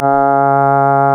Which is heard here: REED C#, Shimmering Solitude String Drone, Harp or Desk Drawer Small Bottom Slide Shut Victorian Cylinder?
REED C#